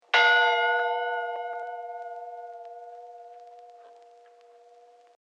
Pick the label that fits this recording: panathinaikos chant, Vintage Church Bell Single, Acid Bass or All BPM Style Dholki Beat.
Vintage Church Bell Single